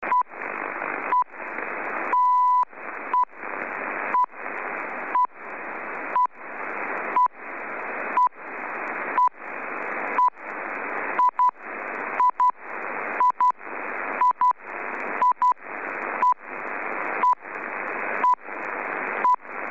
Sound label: usb ft897